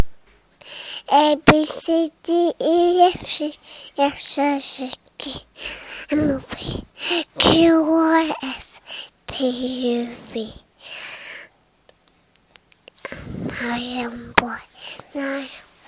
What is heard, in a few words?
singing abc